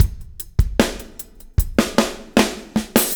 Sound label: REV, REVEREND; Spectrasonics; Backbeat